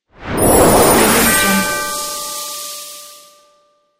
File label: christmas whoosh transition